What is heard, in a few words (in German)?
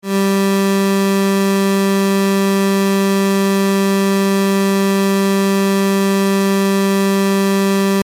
saegezahn; harmonie